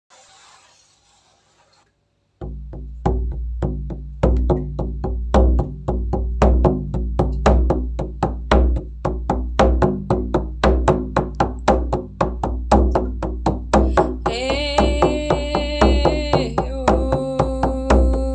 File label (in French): tambour bison 40cm